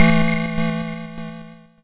YM Chord E; ChipShop Deluxe; ChipShop Hits

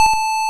Ym Multi Guitar A2; multi-samples; CHIPSHOP DELUXE

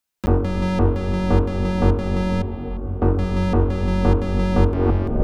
Synth F#, 175 BPM; loops; CHIPSHOP DELUXE

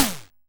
Ym Multi Tom D1; multi-samples; CHIPSHOP DELUXE

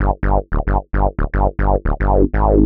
Bassline 90 G, hiphop, 90bpm, Bass; musicradar

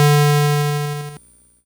GB Bass D; CHIPSHOP HITS

